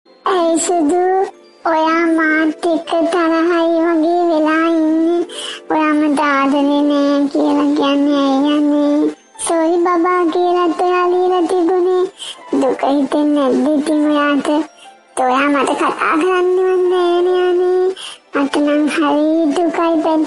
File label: funny laughing notification tone